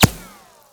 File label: dirt, hit; tacz-tweak-pack; taczmechanics